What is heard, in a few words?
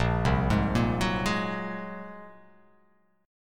A sharp 7th Suspended 2nd Sharp 5th